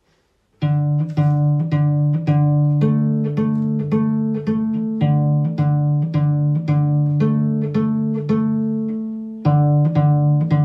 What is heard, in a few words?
Cello pizz